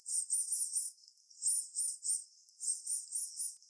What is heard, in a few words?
Cuon alpinus man squack